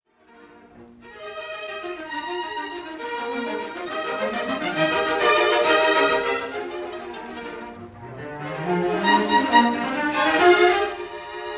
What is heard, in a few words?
Symphony in E flat major, Allegro molto; Poco andante; Symphony No. 3; Eroica; Op. 55